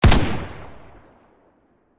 M60 Fire; criminality

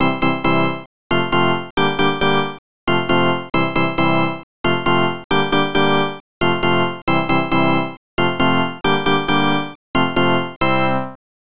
Stadium Organ; ESPN National Hockey Night; snes

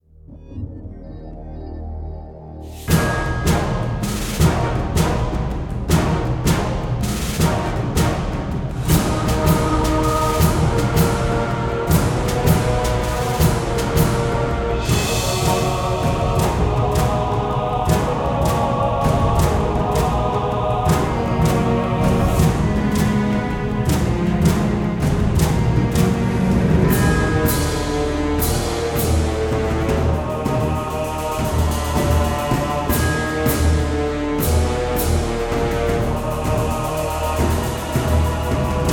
Humans Battle; Disciples III Reincarnation

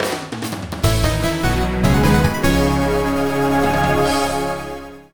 fanfare elevator; Spiral Knights

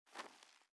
jump generic; out of reach treasure royale 2020 gamerip pc

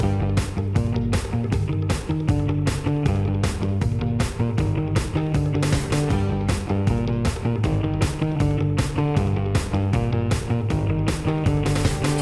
Tornado Platforming MIXED; Ben 10; switch gamerip

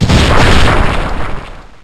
Splintered Stormshards

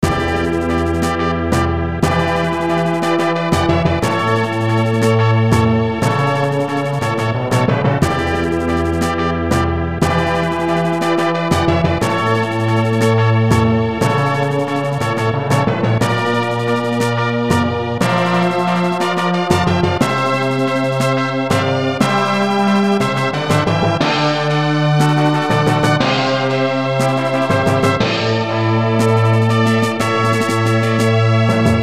Dungeon; Shinseiki Odysselya 2 SNES

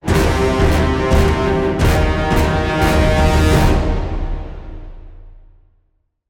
Combat Very High Threat Intro Short; Fortnite

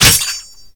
Trap Setoff

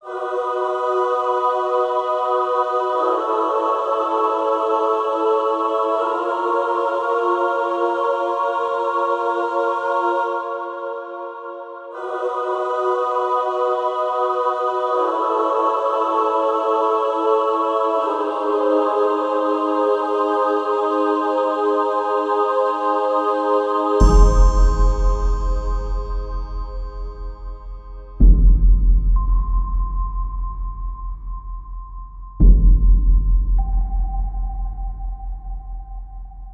Dungeon; oceanhorn monster of uncharted seas

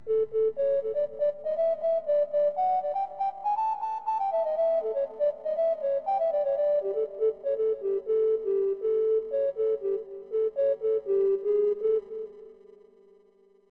Pokemon Flute; Pokemon Snap

